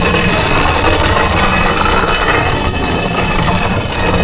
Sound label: grind metal, terrains; Tony Hawk's Project 8; XBOX